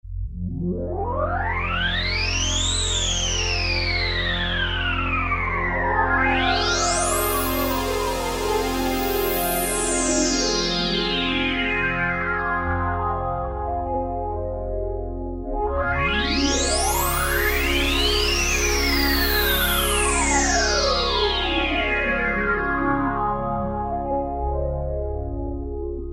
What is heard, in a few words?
Space Sweep, Roland Juno-60; Factory presets; Factory presets group 2